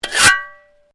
Shovel Scrape; Working with a shovel or spade; Objects

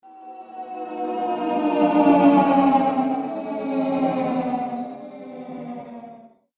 Throbbing Ghost Pulse; Ghost Sound Effects; Sinister, Scary, Spooky; Haunted House Or Graveyard

